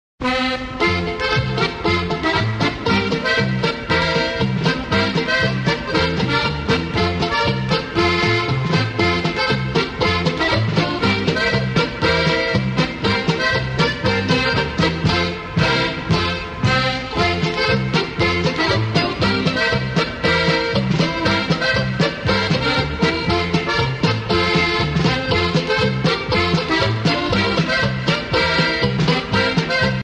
Polka

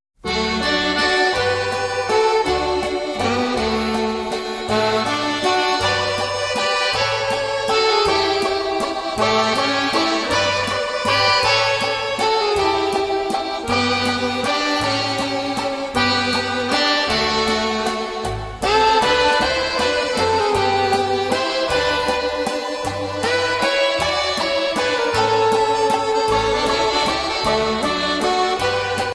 Waltz

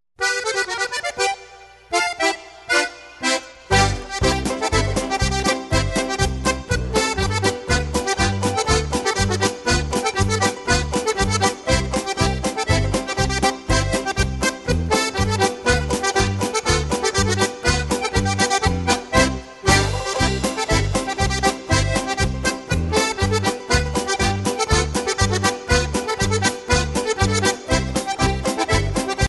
Polka

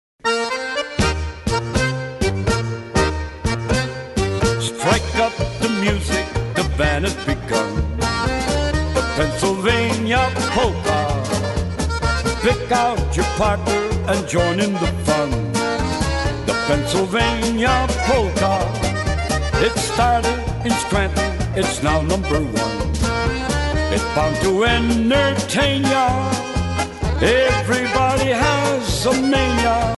Polka

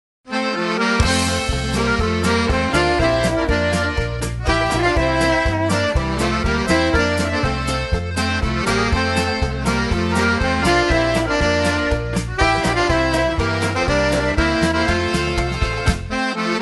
Polka

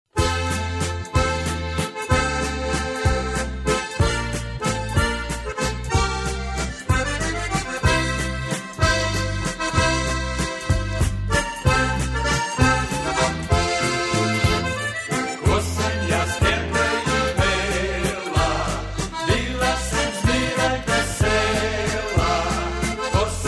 Waltz

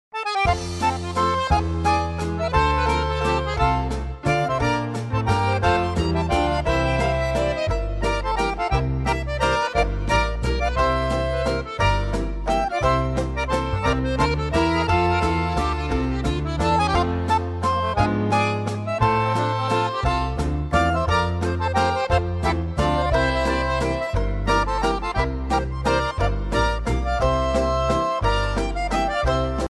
Waltz

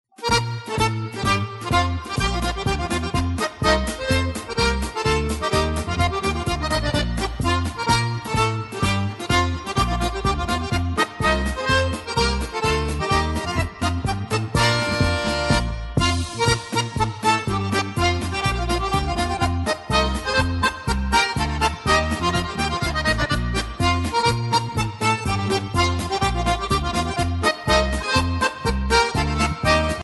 Slovenian Polka Medley

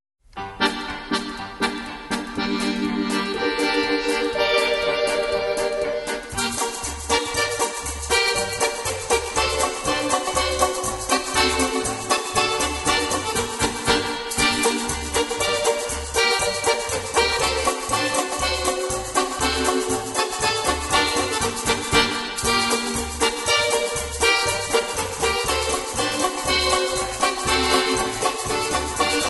Old Country Polka

